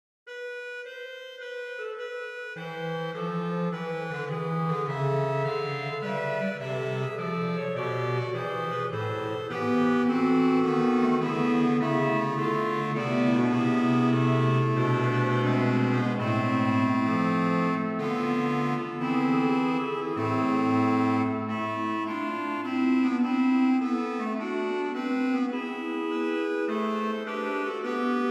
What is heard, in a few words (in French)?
Messe Paroisses Gloria Benedicimus Te Fugue Cromorne, Organ Music, Souvigny; Couperin Francois